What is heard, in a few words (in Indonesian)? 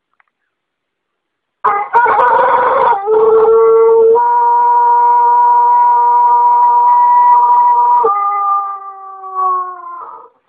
ayam pelung; cakra buana